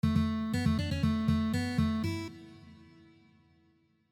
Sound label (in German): Happy Birthday anderer Rhythmus